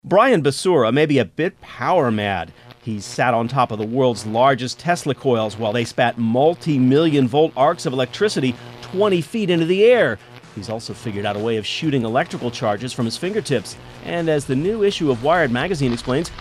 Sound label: zapping quarters